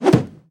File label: Tnt Box Popup